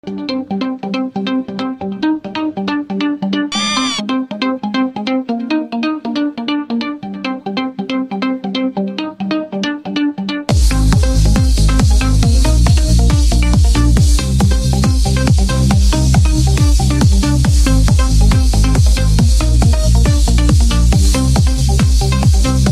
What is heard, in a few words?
Vocal Trance